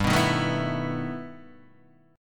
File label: E Minor Major 11th G, guitar